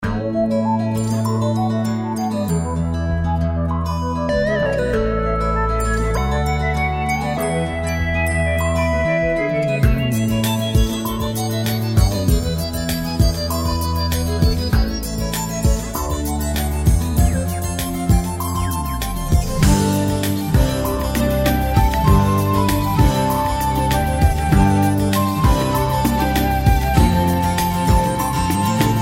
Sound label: town; flyff flaris